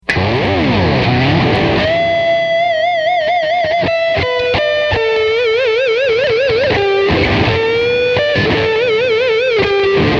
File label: Turbo; v-wah